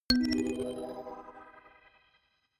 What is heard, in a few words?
notification kalimba magic low